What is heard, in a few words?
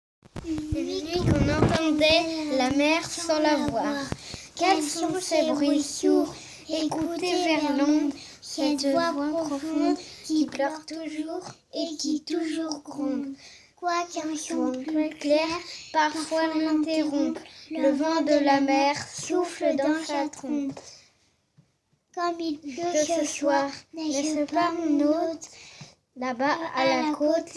Vocal